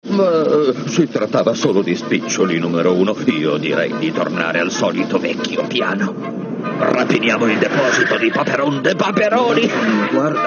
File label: Bass